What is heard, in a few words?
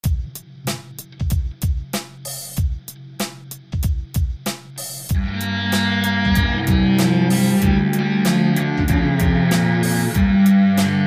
Cello